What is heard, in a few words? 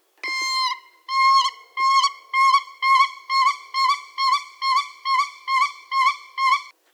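sterh golos, Siberian crane voice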